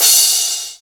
reverb crash cymbal drum sound a sharp key

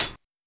treble heavy hi hat sample c key; hat samples

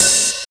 open hat sound f key

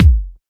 kick drum one shot g sharp key

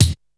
shiny kick drum sound a key; kick drum samples

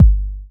phat kick g key; kick drum samples; drum single hits one shots

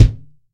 tight low end kick single hit d key

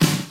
classic dirty snare sample d key; snare drum samples

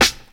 rich top end acoustic snare sound a sharp key; Duration 0:01

